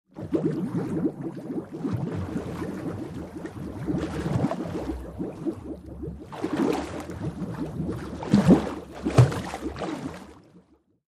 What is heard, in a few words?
Bubbles Big Underwa